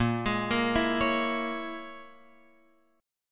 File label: A sharp Minor 7th Flat 5th A sharp, Guitar Open F; A#m7b5 arpeggiated; x,5,8,5,7,8